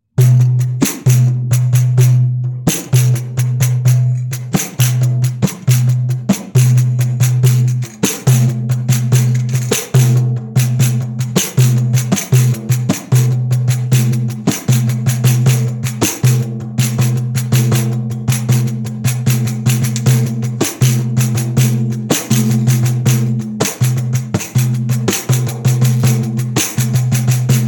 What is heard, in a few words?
instrumental